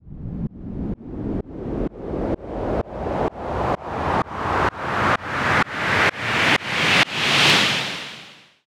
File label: Short Sweep, White Noise